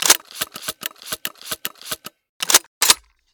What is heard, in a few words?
reload; cof; simon